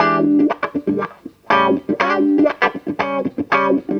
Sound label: EASY GTR, Roots Disco; USB Soundscan vol.32; AKAI